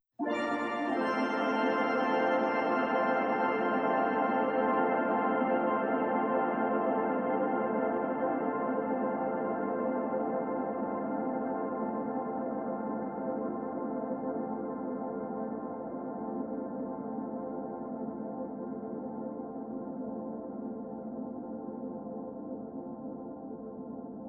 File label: Little Plate on Omnichord